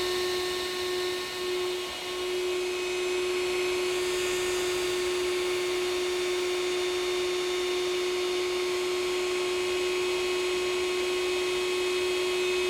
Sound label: buzz ZMM